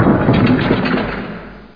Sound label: DEN DOOR; CHASM